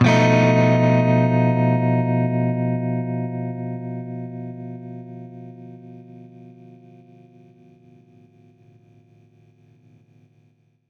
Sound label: Baritone Chord B7b5, Guitar Chords; undercover samples